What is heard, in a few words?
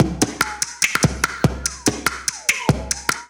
Kit 145 bpm, percussion; Two Rooms; Ableton; Factory Packs; Voice Box